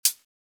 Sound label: Hi Hat; Closet Hat